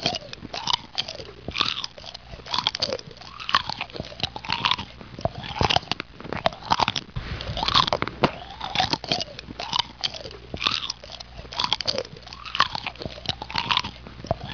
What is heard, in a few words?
eating